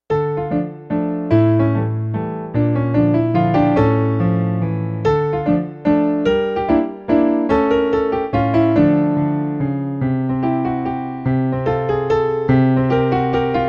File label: dance